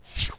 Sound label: click